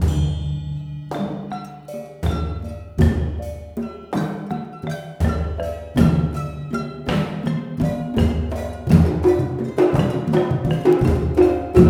MALAY R, Heart of Asia; Spectrasonics - Heart of Asia CD2

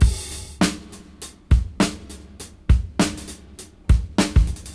Swing 99 BPM Rev; AMG; Keith LeBlanc Phat Butt Jams

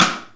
Rimshot; Zoom RT-234; Kit B

